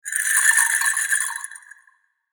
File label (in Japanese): 神社の本坪鈴